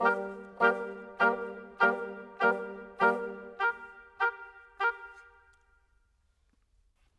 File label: Bassoon Oboe; Sonic Foundry (Sony Creative Software); Orchestral VOL-2; Modern